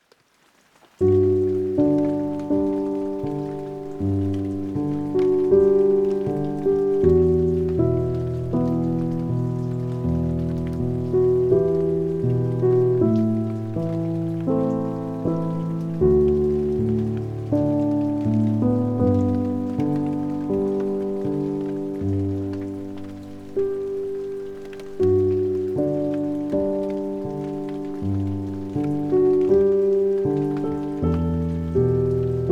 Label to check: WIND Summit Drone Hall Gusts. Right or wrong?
Wrong